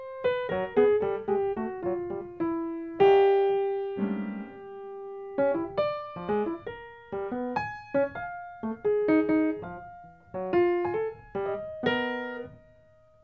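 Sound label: piano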